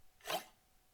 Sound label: Knife